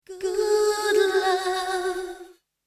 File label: vocal slap mod